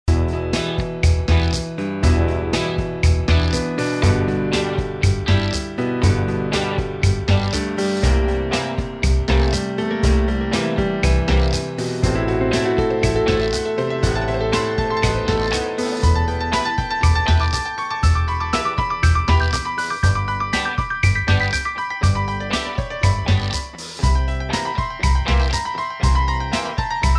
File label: piano